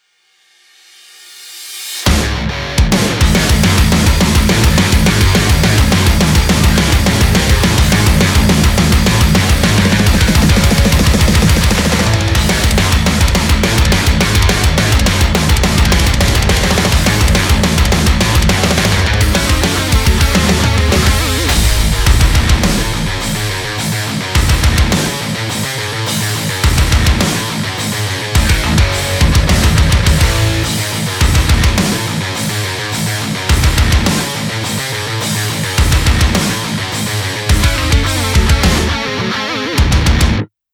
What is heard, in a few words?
soaker metal machine